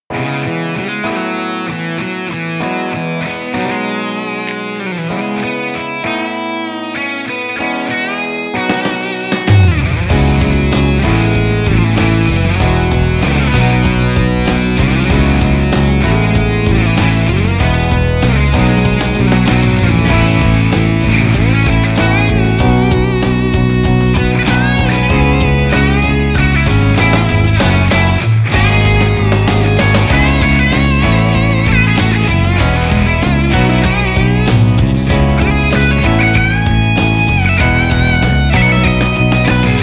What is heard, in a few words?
roomy lead; gt-001